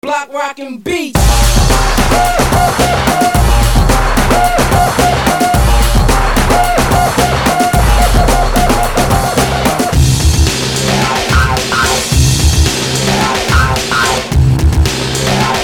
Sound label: big beat